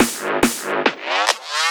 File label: Fills, Loops 140 BPM